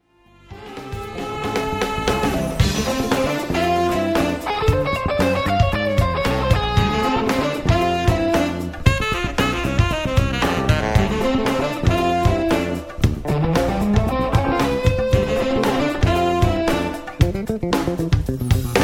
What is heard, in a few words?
Night Funk